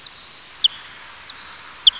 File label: Barbastelle, bats